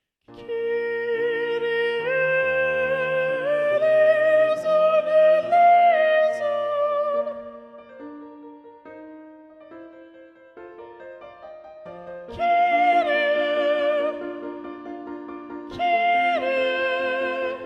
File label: Soprano Predominant; Haydn; Kyrie (Little Organ Mass); Choral Society of Greensboro